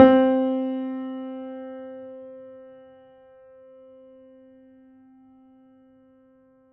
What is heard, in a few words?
piano body C3